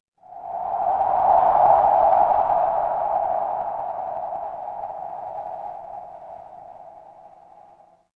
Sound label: horror wind whisper